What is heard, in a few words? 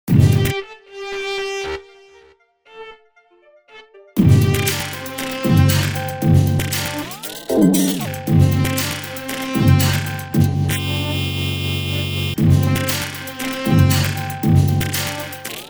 arp grooves